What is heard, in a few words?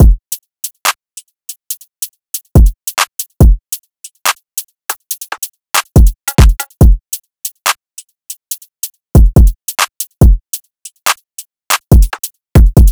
Drum Loop - 141 BPM (Drill); Cymatics; PARADOX II; Drum Loop Collection